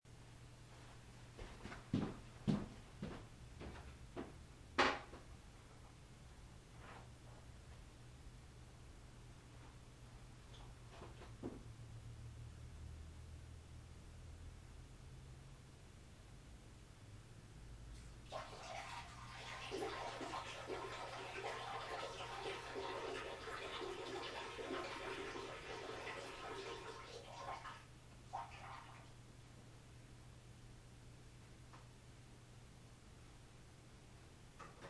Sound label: time tunnel sting